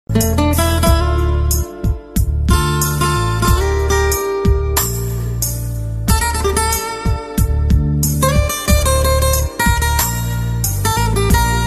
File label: Romanian Guitar